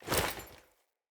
Foley Action Default Melee Heavy Swipe, Default Clothing; Player